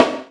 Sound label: Korg N5 Rock Kit